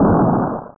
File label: Xplos hor; DX-Ball